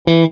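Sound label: guitar short; TamTamMicro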